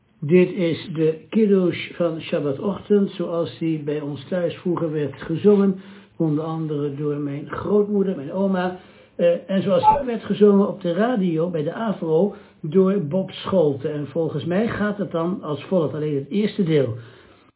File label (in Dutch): zang en lezen; Wesjameroe